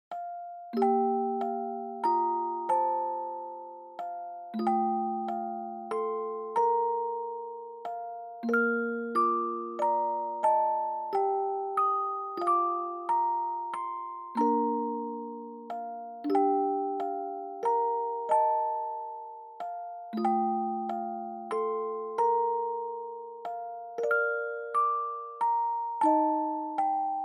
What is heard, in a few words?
Dry Fast; bgm; Happy Birthday To You